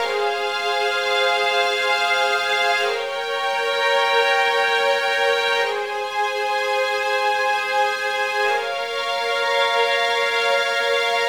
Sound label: Strings, 70s Analog Funk